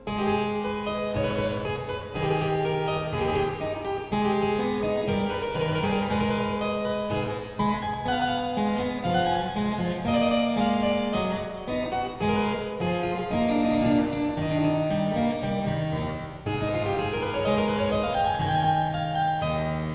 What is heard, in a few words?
aria, harpsichord; Johann Sebastian Bach; Bach "alla maniera italiana"; bwv989